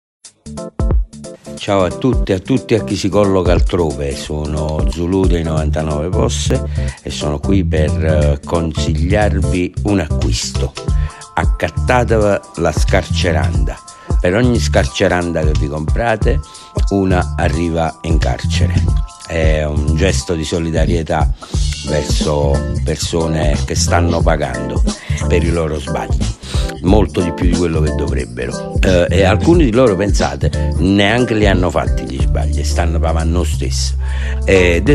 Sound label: jingle; scarceranda; zulu